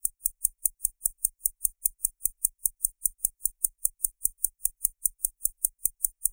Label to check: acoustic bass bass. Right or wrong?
Wrong